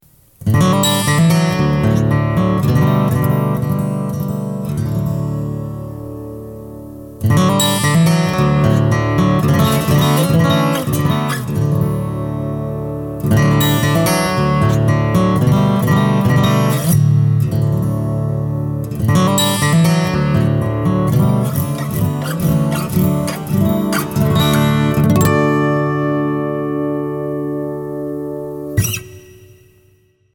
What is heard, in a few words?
FG TA mic 2dB down